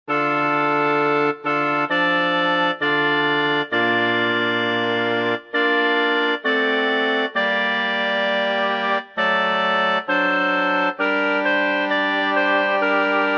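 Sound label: Kyrie Soprano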